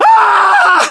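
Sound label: death, male; ModernWarfare; localized english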